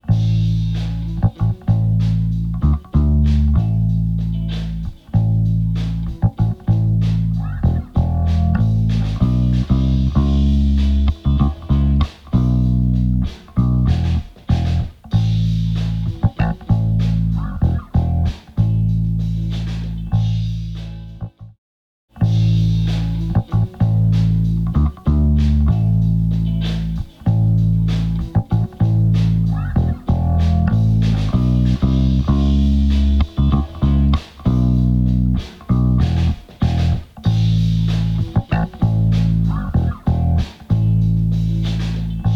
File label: bass comp out in; audio examples from the book